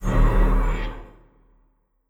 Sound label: Hit Summon monster Cast